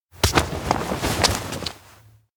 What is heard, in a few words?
hide behind; rikka; anemoi パッケージ版 1.1